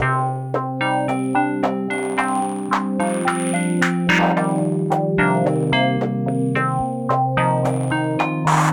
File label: Combination Rhythm; Sonic Foundry (Sony Creative Software); Twine-IDM; Rhythms; Combinations